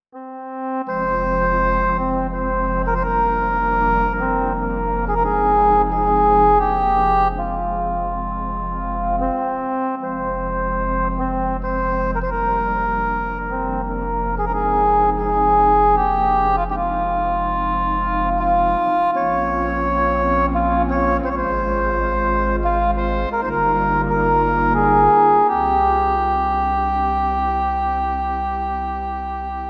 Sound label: English Horn; VIVO S10